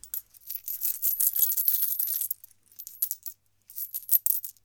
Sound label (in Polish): Monety Brzek Male, coins